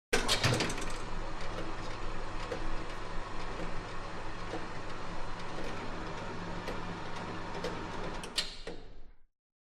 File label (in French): mecanisme horloge